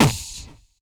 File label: VOC DRUM, MOUTH PERC; Best Service Hyper Dance